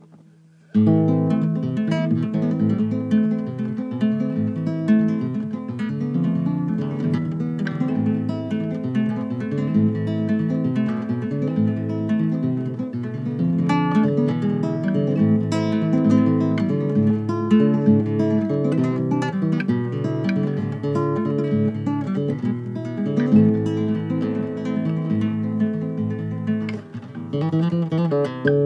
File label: flamenco